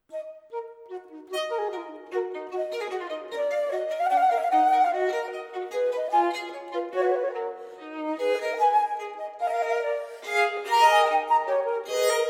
Sonata 2 in E minor - Vivace, Flute and Violin; Joseph Bodin de Boismortier; Six Sonatas for Flute and Violin - Opus 51

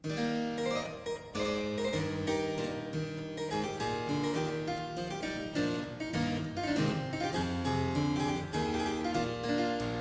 Suite in F major Courante, clavicembalo; Charbonnieres; Suites per clavicembalo

